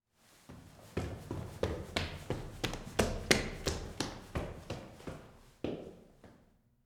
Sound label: Stairwell Run Up; Footsteps; HauntedBloodlines